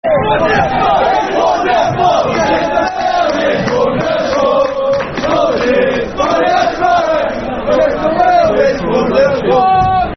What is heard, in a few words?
Football Chant (Soccer Songs); Chesterfield Is Wonderful; Chesterfield Cfc